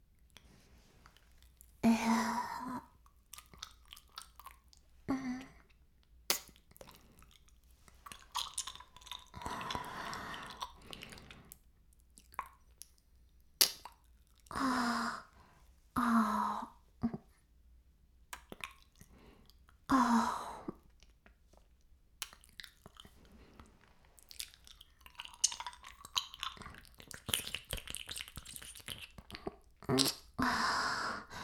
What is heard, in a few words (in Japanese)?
耳舐め音声, ASMR; 白くて黒い魔性円環